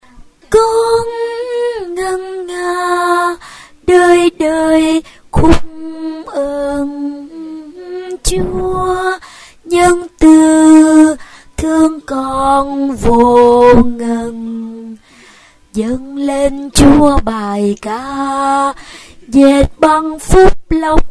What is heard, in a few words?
basso